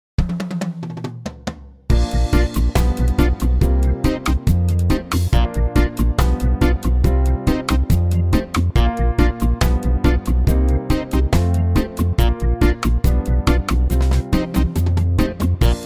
Bb

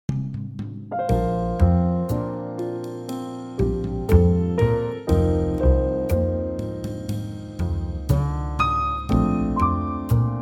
Bb trio

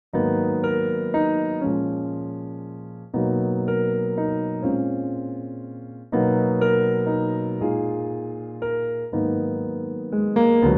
Gb piano

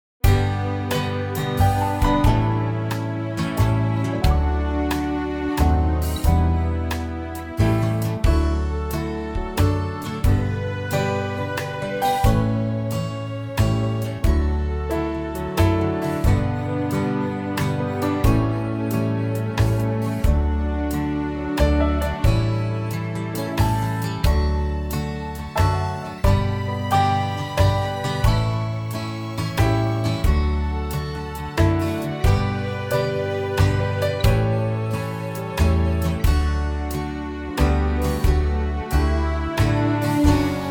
D NO LAP STEEL waltz; key - D; vocal range - D to D; Lovely 3/4 country arrangement; brighter in tempo

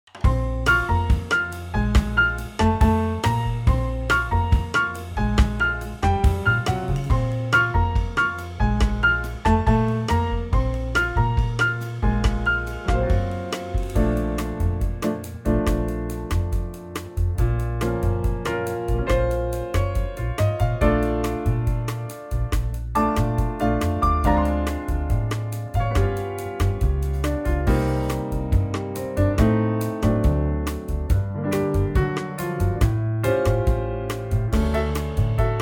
Bb jazzville

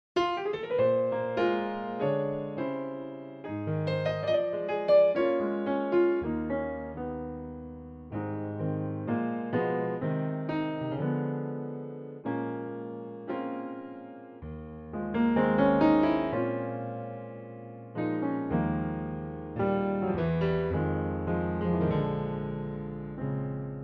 Ab A Bb piano intro; piano only 1st time

